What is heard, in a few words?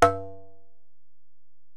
Ac Perc, Drum Machines Alesis DM-PRO